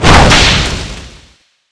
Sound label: whip sword slash skill